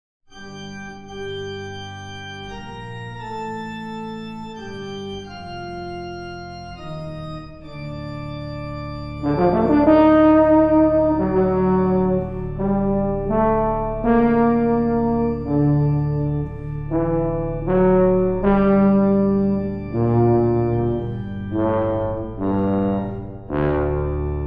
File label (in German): Choralfantasie für Tuba und Orgel